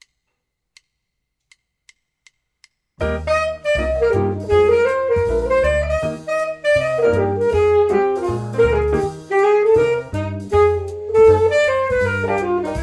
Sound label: alto up tempo swing